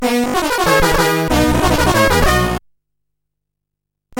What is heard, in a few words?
jingles; superscramble